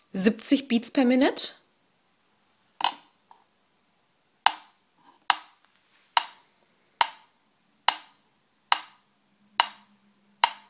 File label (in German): Metronom 40 BpM